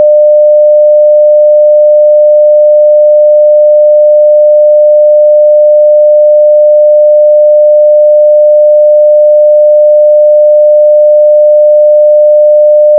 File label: sine, morse